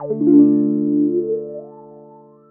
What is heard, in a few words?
GUI CHORD; EastWest; Dance Mega Hip Hop Electro